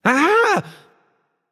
breath; smite; voicepack; osiris

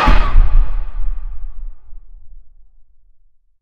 impact; Smite; Zhong Kui

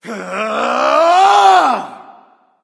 battle cry; smite; voicepack; rama

